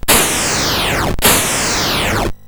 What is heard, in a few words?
BASIC, CSG8580R5